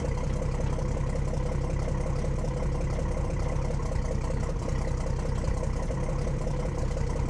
engines; World of Tanks

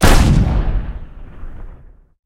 aa fire big; guns; War Thunder

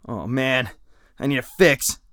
broke hand; Escape from Tarkov; usec2

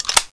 Inf Weapons Foley 3rd; Red Orchestra 41-45

